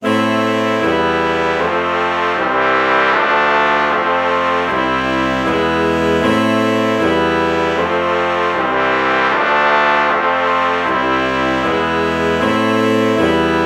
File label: alto saxophone trumpet chord morph